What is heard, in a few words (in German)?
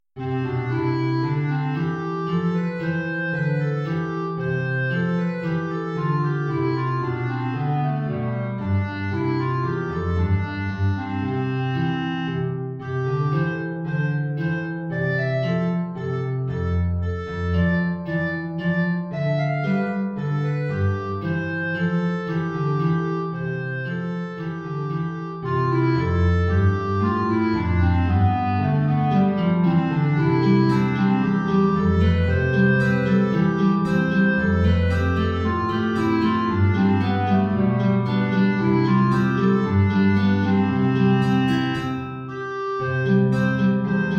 Air Klarinette in Bb Gitarre tief; BWV 212-24